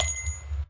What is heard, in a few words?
Bells